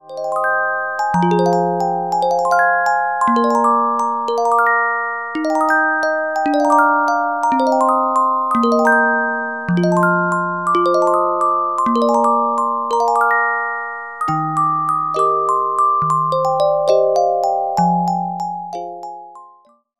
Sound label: Carillon Music Box Melodies